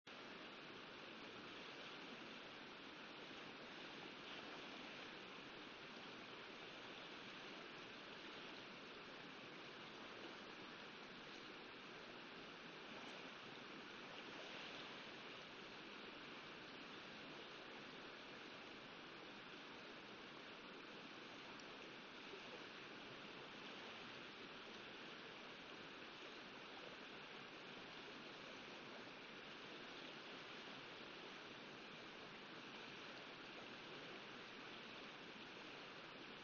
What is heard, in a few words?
riverside